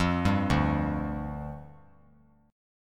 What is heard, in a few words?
D Minor Double Flat 5th, down; Dmbb5; arpeggiated; JGuitar